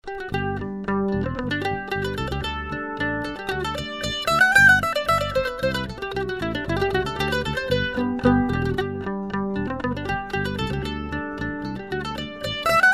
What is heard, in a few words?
Hornpipe